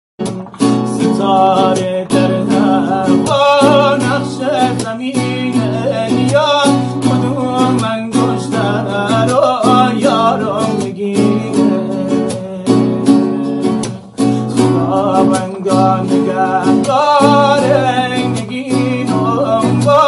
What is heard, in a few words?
guitar